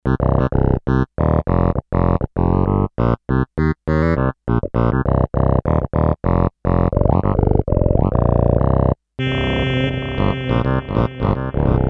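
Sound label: Power Bass, Kawai K5000S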